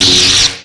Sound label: lt saber body hit, sabre laser; effets speciaux